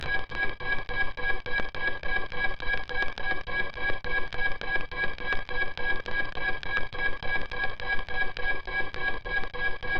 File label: laser gate opening bassy